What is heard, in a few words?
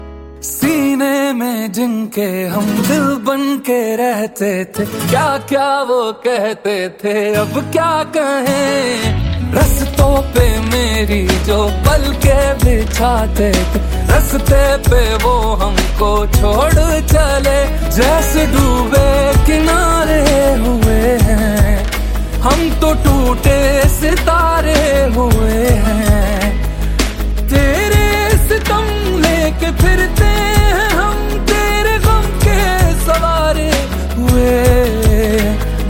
Punjabi Ringtone